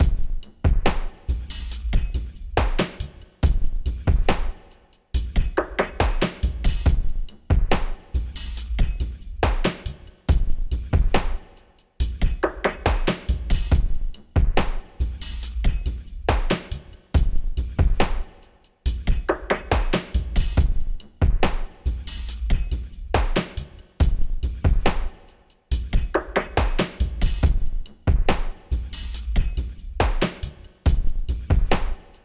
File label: Rythum only version